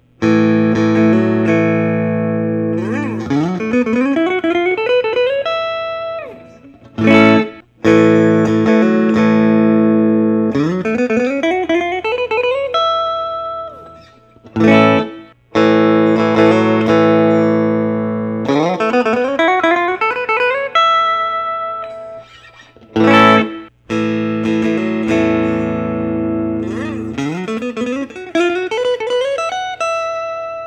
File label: ronin mirari foilbucker; tahitian c